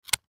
Glasses Case Hard Plastic Open; Materials; Foley; Blastwave

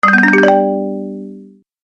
Deep Bonus Win, Video Game Sound Effects

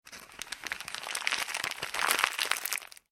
Plastic Packaging Movement; Plastic Packet / Packaging Movement; Materials; foley; McKinney Sound

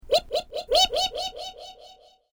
Created Creature Small Ping, Alien Sound Effects; Created creatures, vocalisations and pretend speech

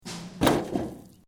METAL SHEET MOVEMENT; Materials

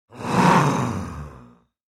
Horror Monster Frankenstein Growl Creature; Monsters

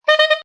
Quick notes on a saxophone.; Horns; Musical

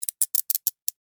Handcuffs Close Latch Clicks; Emergency; Objects

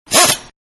Air Wrench Short; Power Tools; Manhattan Production Music

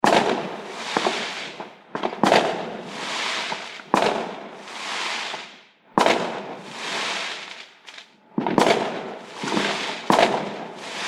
Fireworks Lots Of Loud Explosions

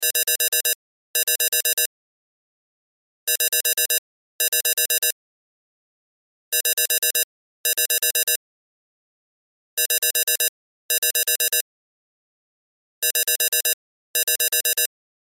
Cell Ringtone; Loop; Cell Phones; Electronics

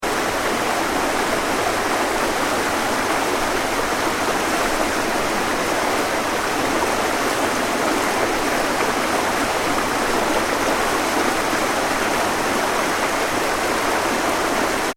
water river shallow fast; River - Shallow , Fast Flowing; Nature; McKinney Sound

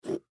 Silly String Up, Silly String Pick Up Table; Objects; Foley; Blastwave

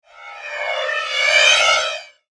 Metal Starfighter, science fiction sound effect

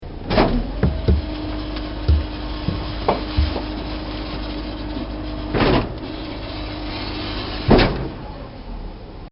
Elevator Switching Room; Machines

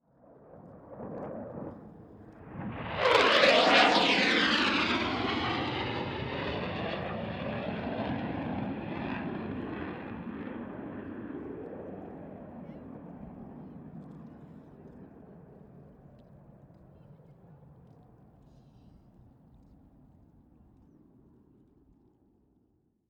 fighter by talk at end, Jet Fighter Flyby; Jet Fighter Flyby, Some Talking At End; Airplanes; Vehicles

